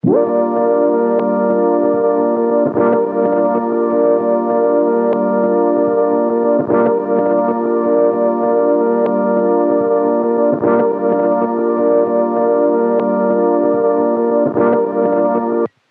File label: Tape Loop Pad - G Min 7; Pads; Cymatics - Eternity Sample Pack